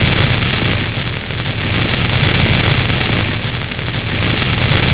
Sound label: fuse